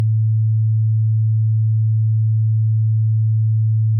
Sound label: sine; no overtones are present, just a fundamental; 110Hz; missing fundamental